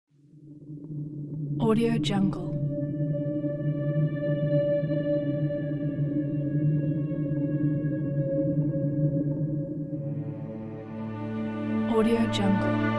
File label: panic at night ident